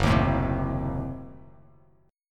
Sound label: F sharp Diminished 7th F sharp Bass Standard; strummed; JGuitar